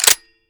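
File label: bolt back, rifle; m76; sven; cof; guns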